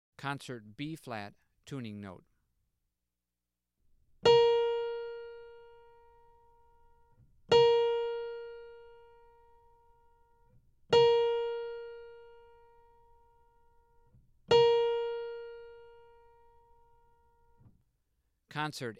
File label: Tuning Notes; James „Jamey“ Aebersold Play-Alongs; By Request – One Dozen Standards